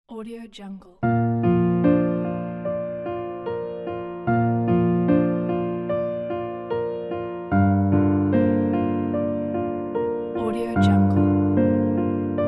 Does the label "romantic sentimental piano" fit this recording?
Yes